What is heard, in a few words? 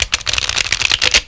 multipage; Twister